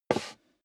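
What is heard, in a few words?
step leather shoes wood creak slide short, footsteps; schritte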